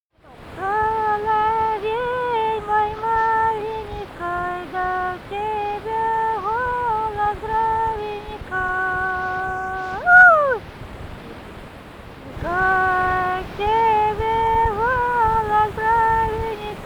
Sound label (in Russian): егорьевская; Соловей мой маленький; Соловьёвка